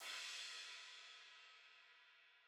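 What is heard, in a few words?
CRASH HIGH CRASH HIGH CYMBAL, analog recorded drum; A-CONSOLE; A-EQ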